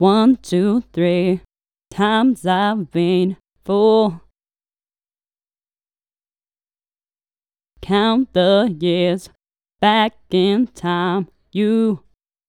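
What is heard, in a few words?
122 D minor lead octave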